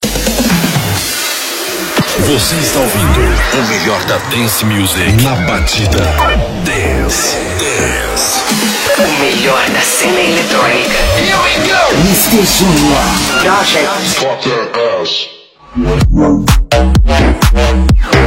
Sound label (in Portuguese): Batida Dance